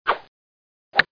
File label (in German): schleuder